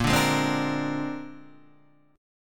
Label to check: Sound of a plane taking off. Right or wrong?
Wrong